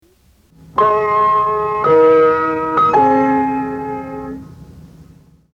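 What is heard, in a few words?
Radio Canada International Interval Signal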